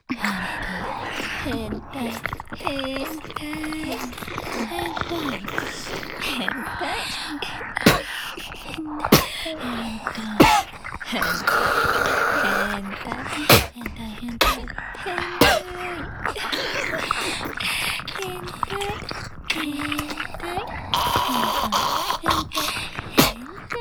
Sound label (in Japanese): 唾吐きループ（オノマトペなし）